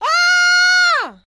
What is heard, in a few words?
female scream